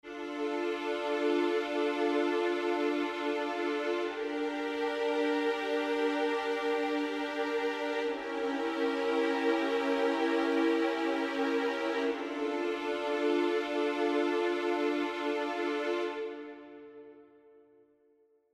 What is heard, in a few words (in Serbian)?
akordi gudaci mol